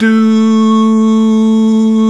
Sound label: VOX, Male Scats